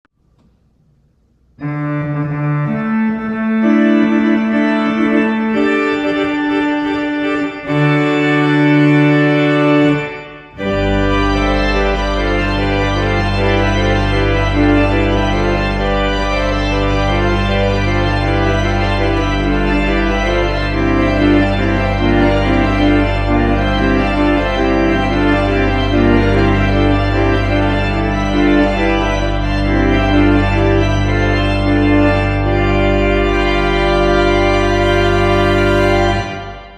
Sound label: Finale Organo Pleno